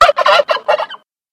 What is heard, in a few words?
monster turkey